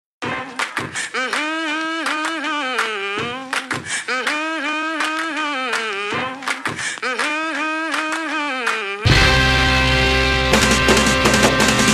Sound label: Drill Team Tryout Music Jazz; Mansfield HS